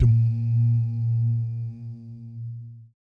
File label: BS DUMM, Vocal Planet Jazz & FX; ILIO; BASS DUMMS